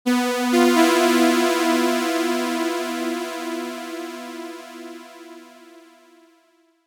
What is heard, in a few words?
Strings; PatchFactory